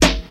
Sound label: prominent acoustic snare g key